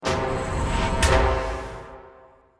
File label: Stinger Action Medium